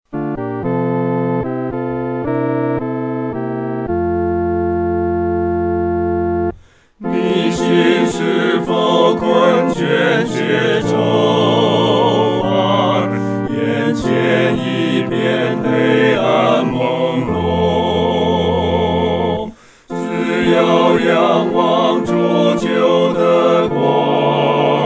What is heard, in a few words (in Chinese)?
合唱 四声部; 当转眼仰望耶稣